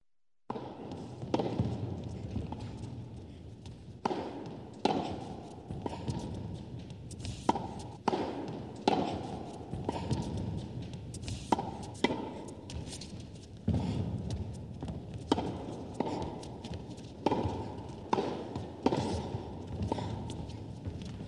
tennis aplodismenti zritelej

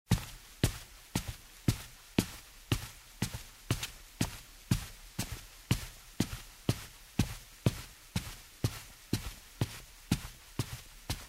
shagi v tuflyah po derevyannoj lestnice pokritoj kovrom

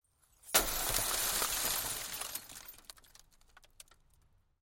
zvuk razbitogo stekla na melkie kusochki

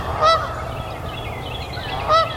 krik dikogo gusya v prirode

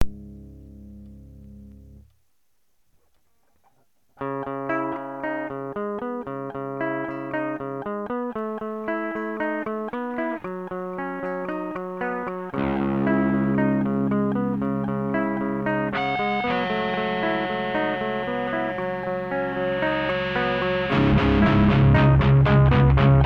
rok gitara obemnij 3d zvuk

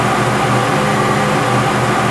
neumolkaemij gulkij shum dvigatelya

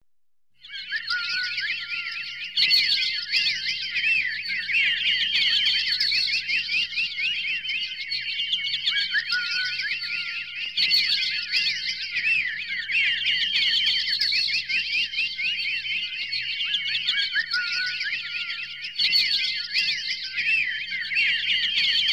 raznie ptici obshaya atmosfera mnogo ptic

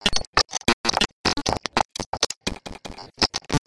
kliki rezkij nudnij

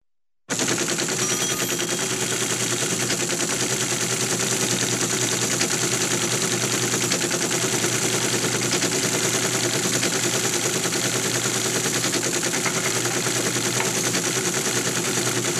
teletajp ctarogo tipa bistraya pechat

